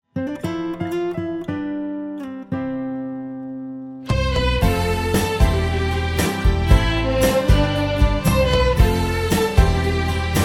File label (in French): Instrumental